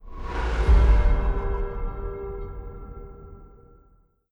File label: ui distort